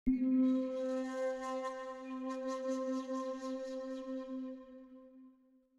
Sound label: ambient